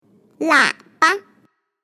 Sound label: TRUMPET